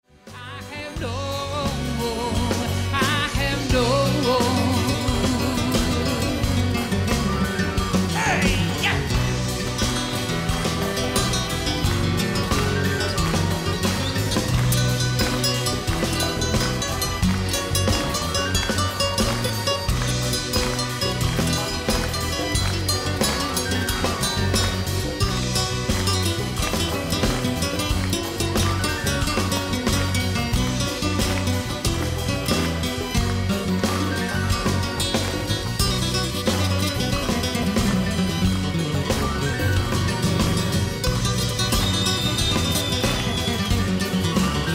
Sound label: Latino live solo